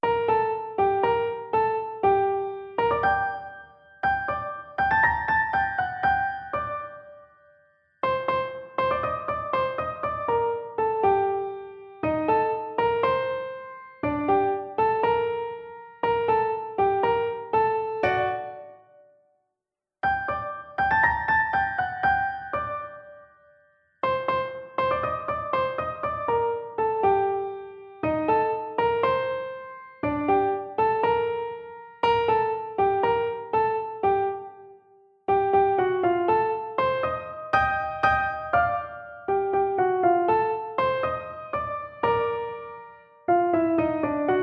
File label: Tango Prelude, primo